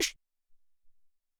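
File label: Vox Snare, Alesis SR-18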